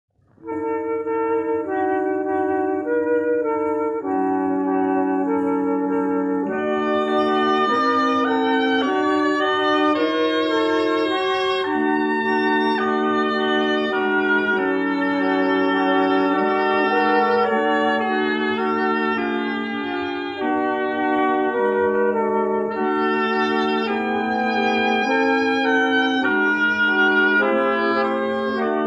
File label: wind quintet; elsa barraine